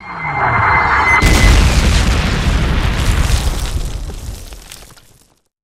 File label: mortar incoming; avant gardens